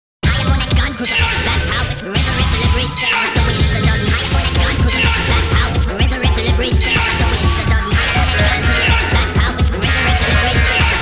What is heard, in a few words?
250bpm; beatmania IIDX 4th style